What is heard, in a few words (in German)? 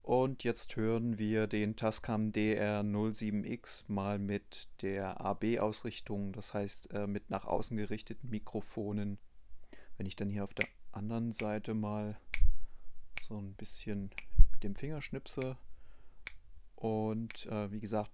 tascam dr-07x ab-ausrichtung